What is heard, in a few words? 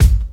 studio bass drum single shot a key; kick drum samples